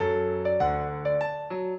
piano; Mozart; minuet

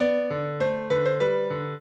trio, piano; Mozart

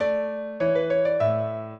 minuet, piano; Mozart

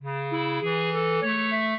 clarinet; Mozart

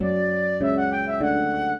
minuet, flute harp; Mozart

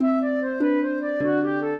trio, flute harp; Mozart

